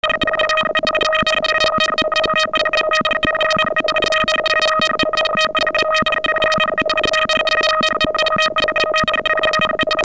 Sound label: SCIFI